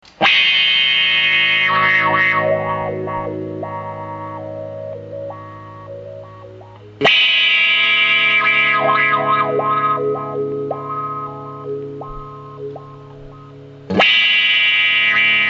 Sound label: autowah with sh